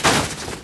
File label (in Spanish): choque, vehicle; vehicle mod